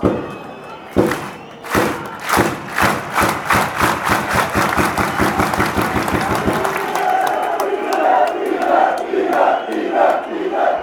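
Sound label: clap thun, football songs and soccer chants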